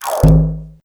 enter pod; Garry's Mod; racing tool

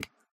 foley concrete step run; sw foley six concrete

